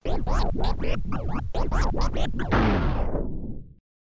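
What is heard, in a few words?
tape got chewed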